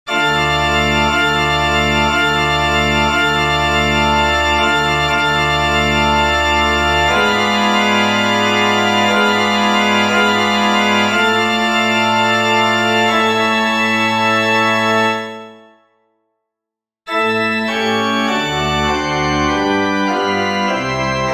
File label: for pipe organ; vivaldi antonio; suscepit israel from magnificat